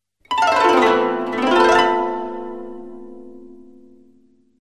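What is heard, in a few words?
koto glissando ascending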